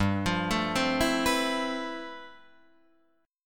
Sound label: G 13th G Guitar Drop D, chord